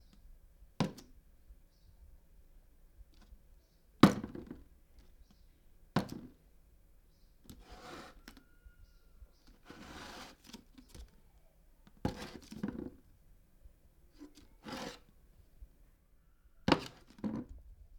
Plastic Bottle Placement

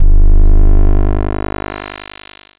Logotype Raw Intro Outro Trailer

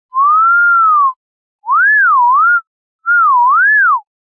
Synthetic Whistles

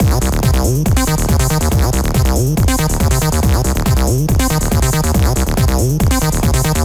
Kick Hats

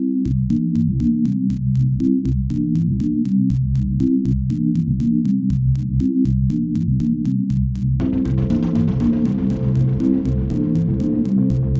Deep Groove

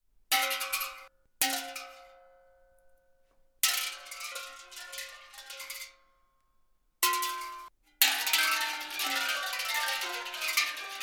Rolling Coins In Steel Drum

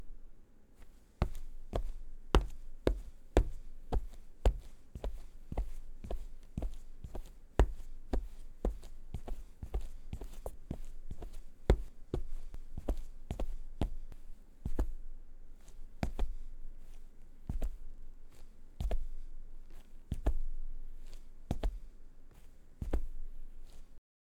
Footsteps Concrete

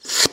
Suck Pop

